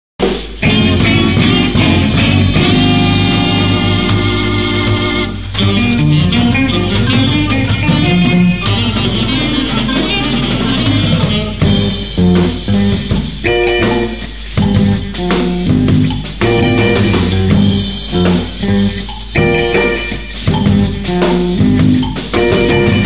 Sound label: funk